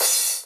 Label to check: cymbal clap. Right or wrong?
Right